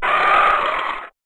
chicken flyer big

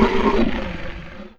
chicken big die

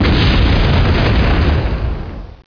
bomb hit, weapon

